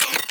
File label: Reload; Crp BFG